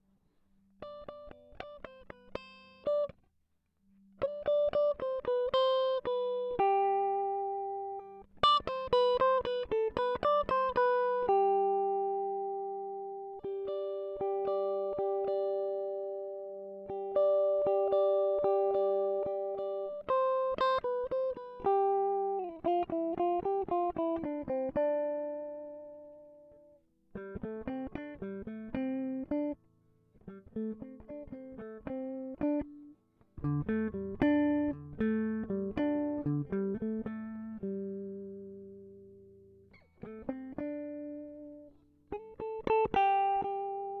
Snow riffs

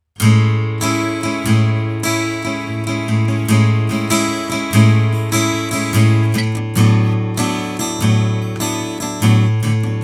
little guit impro

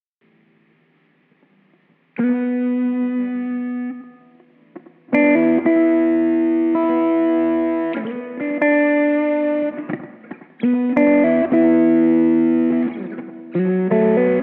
E 102 Drum Lo Bass Gtr Buzz

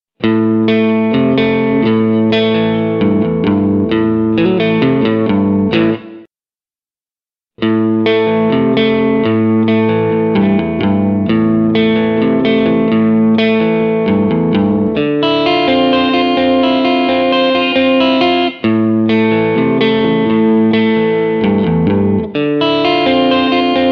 Blues Country Riff A 130 BPM

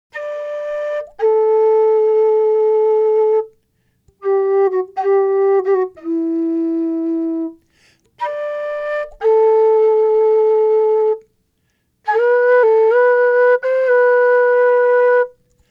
Em120 WHISTLE dry; Flavourtones

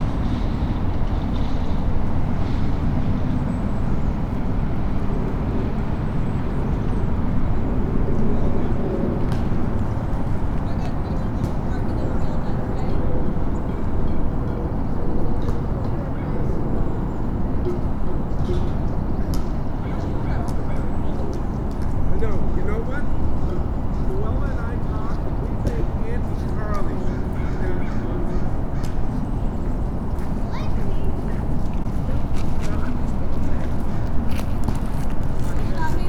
wooden xylophone; Coruscate

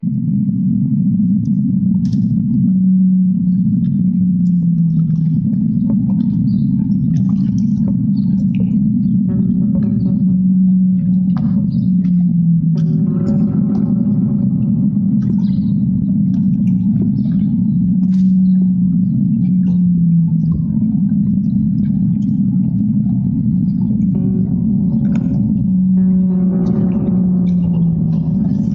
whales remix

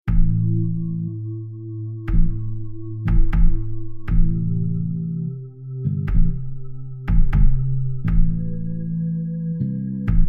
Am120 Blu Groove

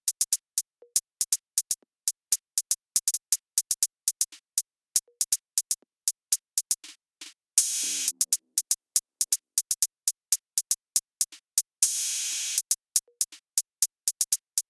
808 Groove

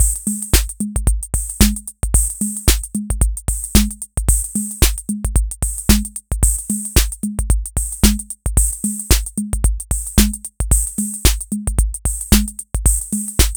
Inequality Groove

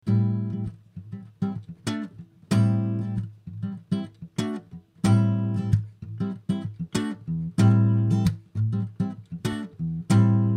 Mellow Groovin Guitar; admiralbob77

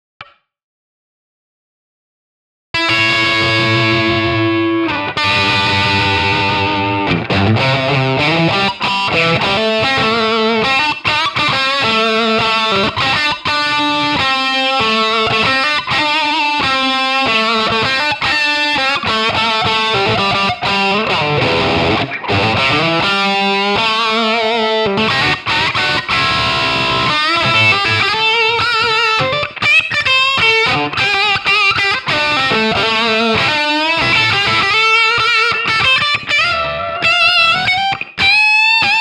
guitar hackery in A; admiralbob77

